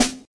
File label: snare; osu